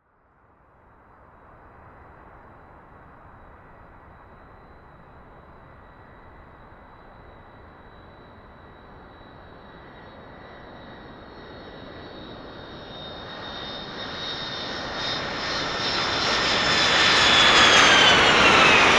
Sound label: 747 jumbo jet landing